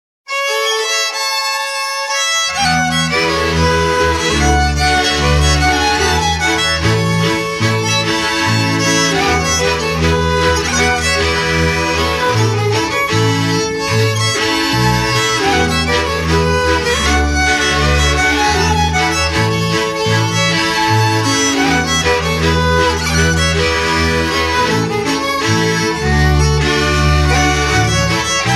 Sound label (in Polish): Krakowiak